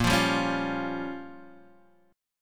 A sharp Minor 6th A sharp, Guitar chord

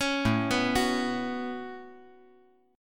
A 6th Add 9th A down, chord

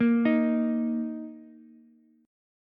Eb 5th, Ukulele; JGuitar

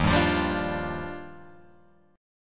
D sharp Major 9th, Guitar Open G; strummed

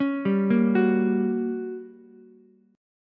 G Minor Major 7th Flat 5th, G, down, Ukulele; arpeggiated; JGuitar

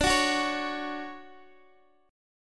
D-Major 7th, D, Guitar, Open D

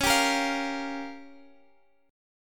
Db 6th Flat 5th Db, down; Db6b5; strummed

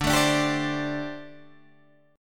D 7th Suspended 4th, down, Guitar, Open G

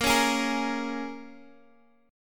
A sharp Minor 7th, Guitar, Open G

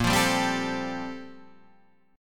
G Minor 11th A sharp

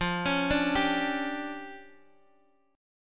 D Minor Major 7th Double Flat 5th, F

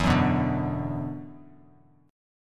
E 7th Suspended 2nd, down, Bass Standard

